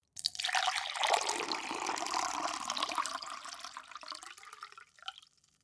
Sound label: glass pour hard; soundpool